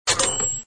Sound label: clink; paint makers